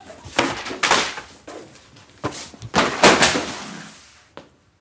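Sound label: Book Thrown Off Shelf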